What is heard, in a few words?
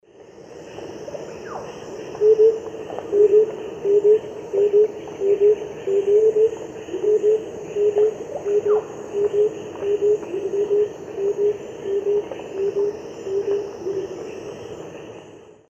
Capito auratus, songs 2 birds; S. America; Eastern Lowlands